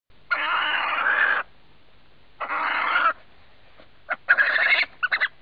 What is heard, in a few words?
wail